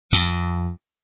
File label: bass guitar